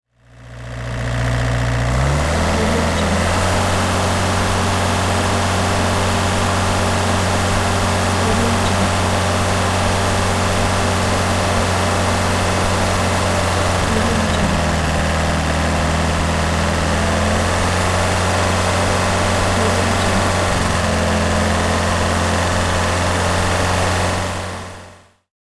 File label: excavator engine full power and slightly down